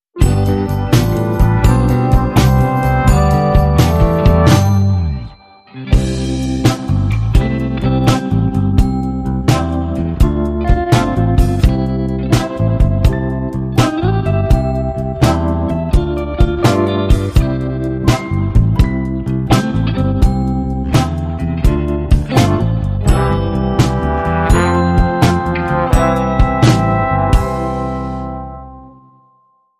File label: Ballad